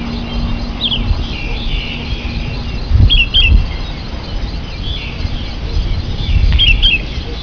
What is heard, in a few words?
Rvbulbul, birdcall